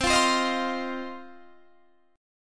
C Minor Double Flat 5th C down Guitar DADGAD

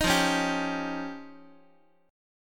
F 7th Suspended 2nd Sharp 5th, Guitar; F7sus2#5 strummed; x,x,6,3,5,6

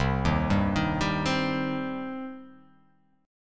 C Minor C down; arpeggiated; JGuitar

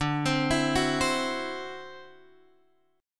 D 9th D down Guitar Open G

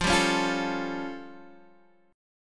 A Augmented 7th F

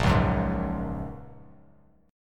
Bb Minor 7th Sharp 5th, Bass Standard; Bbm7#5 strummed

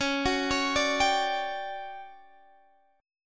D Major 11th Db, chord

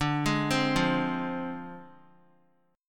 D Minor 7th Flat 5th; Dm7b5; arpeggiated

